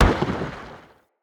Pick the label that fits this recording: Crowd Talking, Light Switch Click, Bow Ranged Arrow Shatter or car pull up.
Bow Ranged Arrow Shatter